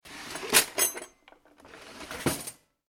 household drawer cutlery open close; Cutlery Drawer Opens Then Closes - Heavy Wooden Drawer Slides Open With Clunk Of Metal Cut

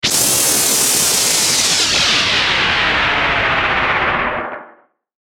Descend Bright Power Down; Descending Sound Effects; Blastwave

